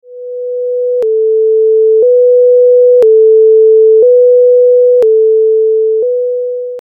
fire siren

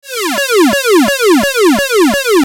cartoon police siren

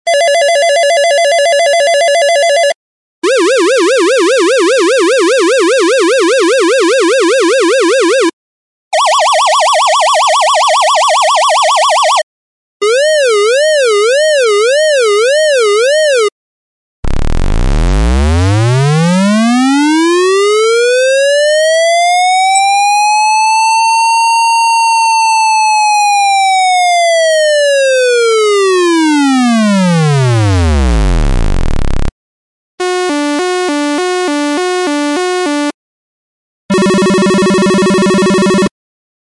dub sirens

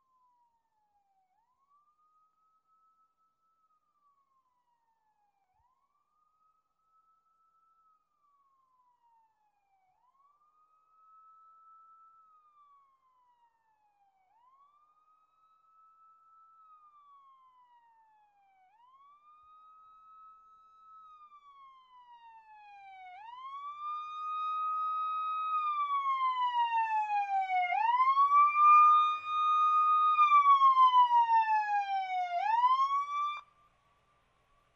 Fire truck siren approaching pure

